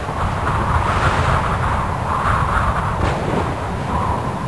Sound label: STORM; Herrscher